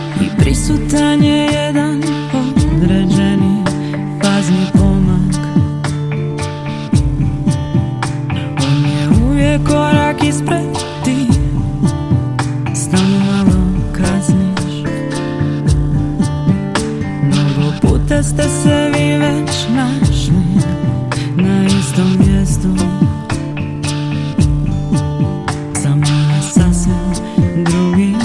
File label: beatbox